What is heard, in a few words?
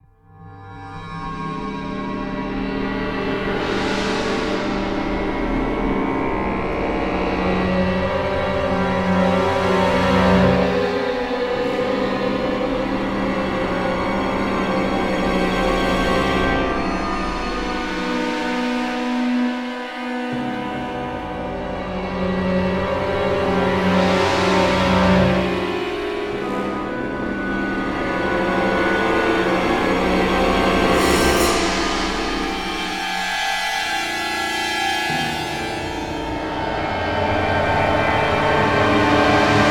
Music Danger Alert Clusters & Gongs; starcraft 2 wings of liberty; MissionMusic